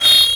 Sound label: MET SW SIN, SWORDSING